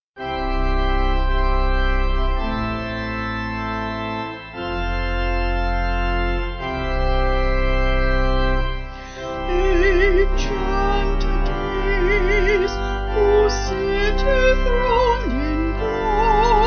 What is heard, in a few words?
Vocal Organ; Vocals and Organ; Sung Lyrics